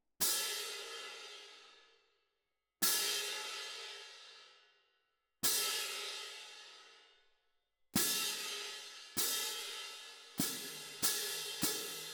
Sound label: Cymb Close